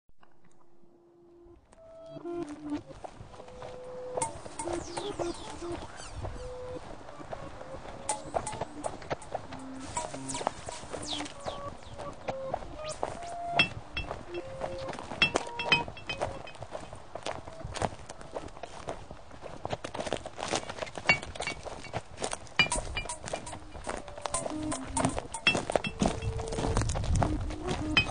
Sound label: brick soundscape experiment